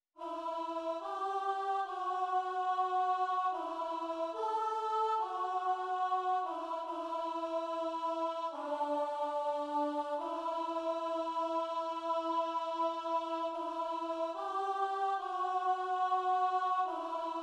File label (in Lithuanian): SOPRANO; Viešpatie pasigailėk; Šv Augustino mišios